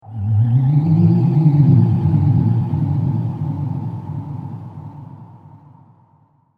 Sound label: Wooo Haunted Ghost; Ghost Sound Effects; horror sound effect; Andrew Fleming